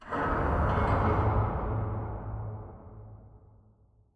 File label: Robot Arm Pass Prep Dist; Boomtown; Titanfall 2